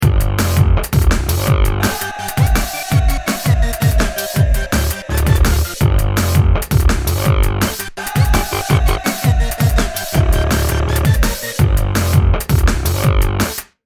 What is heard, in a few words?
break